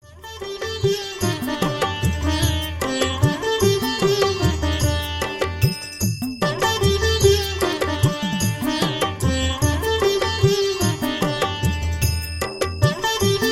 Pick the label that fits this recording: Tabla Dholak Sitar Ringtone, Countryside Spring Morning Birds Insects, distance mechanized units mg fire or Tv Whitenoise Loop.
Tabla Dholak Sitar Ringtone